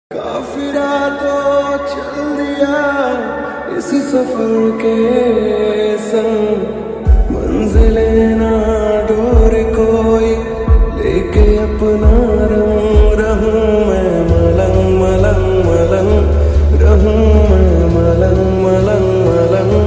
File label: ringtone bgm ringtone lofi song ringtone